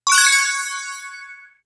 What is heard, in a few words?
Collect Card Reward